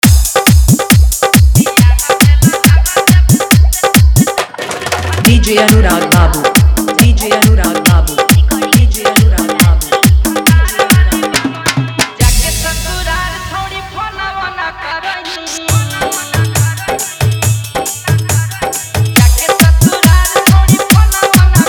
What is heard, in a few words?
Hard Dhollki Bass Mix